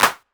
Clap, Dubstep One-Shot Drums

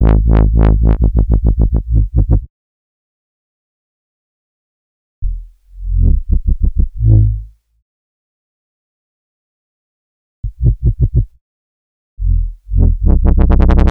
Bpm138 Bass Loop Fmaj, Dubstep; Observer; Prime Loops; Dubstep Illusions

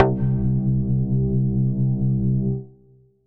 Synth Shot C

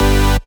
Synth Shot G; Mutekki Media; Vengeance Electroshock Vol.2

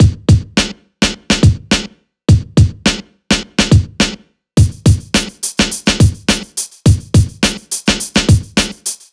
105 Brooklyn Beat, Breaks, 105 BPM; loops; Loopmasters; Jon Carter & Alex Blanco; Block Party Essentials

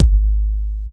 Kick

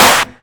Claps & Snares

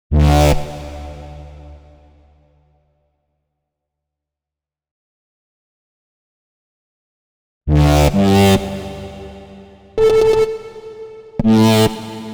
128 Dm Bass, Complex Electro Synths; Prime Loops; Deus

